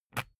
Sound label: briefcase plastic latch lock key pull out; industrie wirtschaft; bueroarbeit